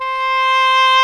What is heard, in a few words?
DOUBLE REEDS; OMI - Universe of Sounds